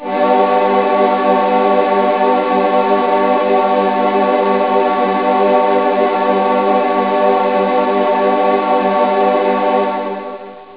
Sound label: smooth, synth